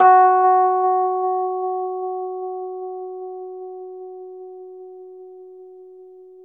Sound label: Rhodes 73 F#; E-MU Producer Series Vol. 5 – 3-D Audio Collection; 3DRhodes